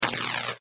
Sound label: Electrical Arc Zap Short, High voltage electric sparking; arcing; Electricity sounds